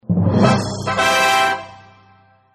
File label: win; 2048